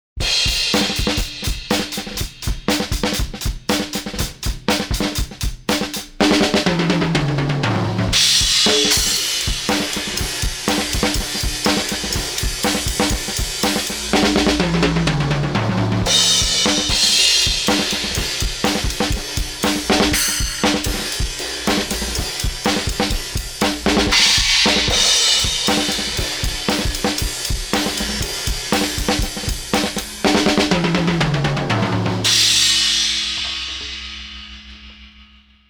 Drums; smart EQ 3

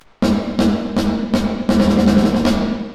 Pan Mod L R LFO Rate 0.72 Hz; Reverberate3

